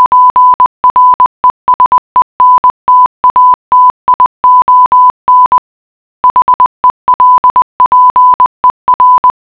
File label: morse